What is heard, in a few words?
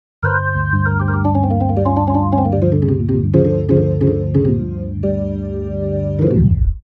Hammond B3 Mode B3 Low Slow